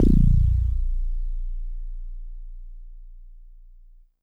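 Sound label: Mono Bass DX100 A#4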